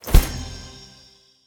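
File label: Movement Landing; smite; god bakasura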